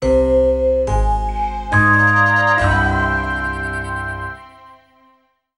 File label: Jingle